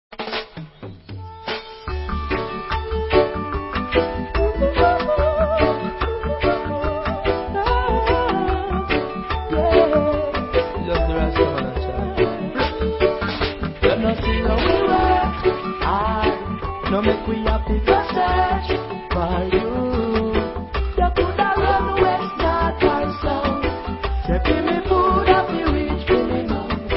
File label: flute riddim